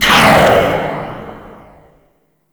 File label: Corpus UMP Tail